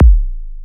Kick E2; EMU Orbit-3

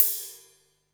hat, Techno 138 BPM

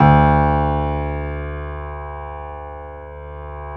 PIA; Best Service Gigapack 1

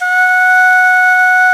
VENU F#4, Winds; E-MU Producer Series Vol. 7 – Old World Instruments

